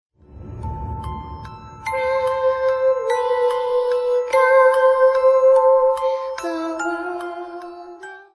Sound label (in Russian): shkatulka i penie